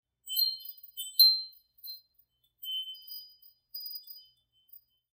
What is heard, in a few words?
Wind Chimes C